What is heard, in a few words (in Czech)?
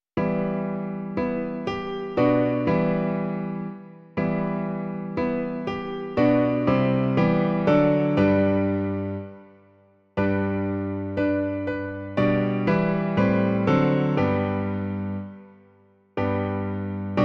klavír, Klavírní verze